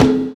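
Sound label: PERCU RIDE; Univers Sons - Kitch 70s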